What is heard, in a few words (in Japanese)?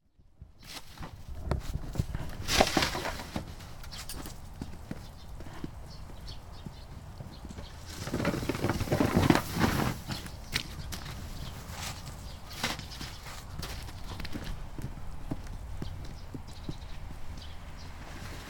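josetsuki katsu ichigou; 除雪機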